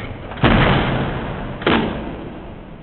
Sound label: door close; wolfstuff; wolf3d